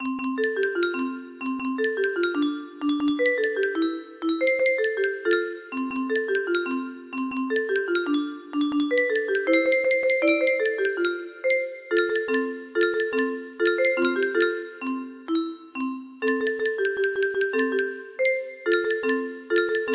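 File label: jingle bells, music box melody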